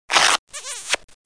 slurp

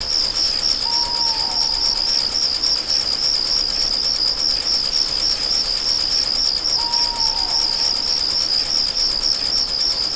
Sound Atmo

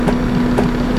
treadmill Loop

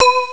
dong

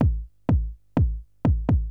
drum loop house 125; eJay

